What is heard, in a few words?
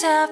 vocal